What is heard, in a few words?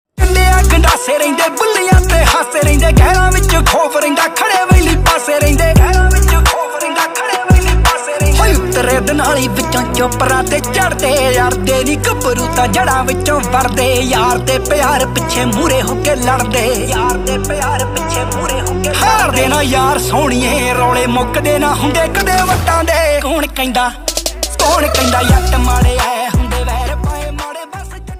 Desi Punjabi Ringtone